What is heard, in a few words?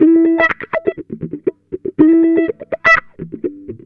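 wah funk; Jazzistic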